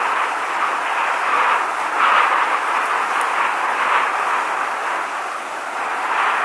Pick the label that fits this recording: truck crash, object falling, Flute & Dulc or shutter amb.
shutter amb